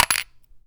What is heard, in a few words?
coin drop; blackjack